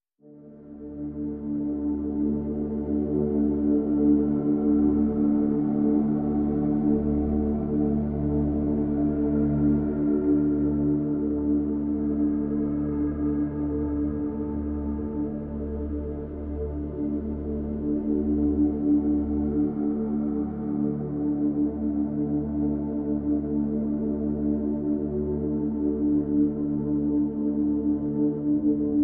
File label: Pads D